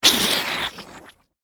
biter death; factorio; Factorio 0.14.21; creatures

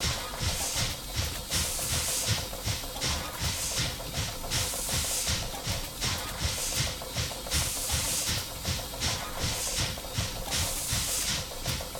steam engine 90bpm; factorio; Factorio 0.14.21

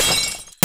shatter; MarbleMarcher

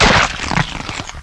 SPLAT

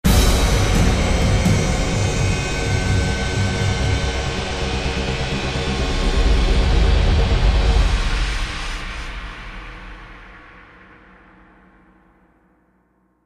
train failure; CounterStrike; cstrike

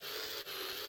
inserter long handed; factorio; Factorio 0.14.21

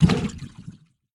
spawner death; factorio; Factorio 0.14.21; creatures

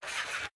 inserter working; factorio; Factorio 0.14.21

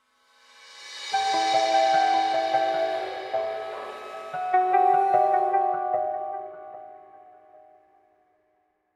main menu motif; factorio; Factorio 0.14.19

